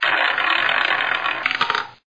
wheel

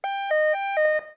siren

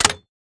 thwoop

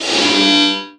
airy

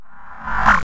object spawn

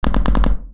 ratatat

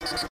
dialog cancel

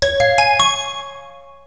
piano nice

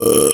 belch

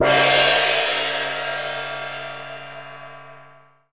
gong

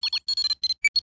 tool droid; startopia

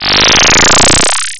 levelup

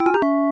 slot lose

